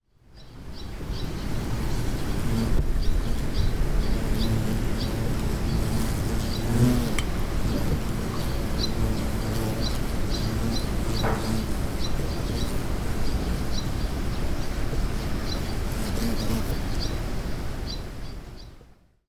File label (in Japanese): hataraku hachi hane no oto; Chino Cultural Complex